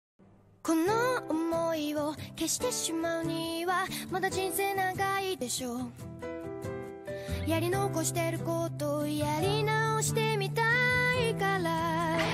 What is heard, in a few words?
Ringtone Anime opening ringtone; Metal Alchemist Brotherhood